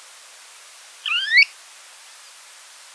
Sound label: Snowy plover